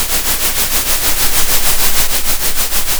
pop, Sound of a train

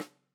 Rim

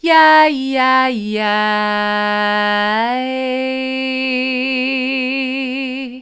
yi yi yi mid, vocal

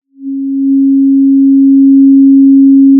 Sound of a cruise ship horn

